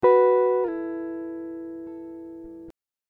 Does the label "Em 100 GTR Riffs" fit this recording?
Yes